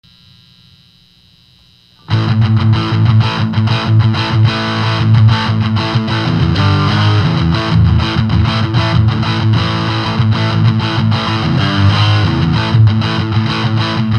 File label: 6br7 strat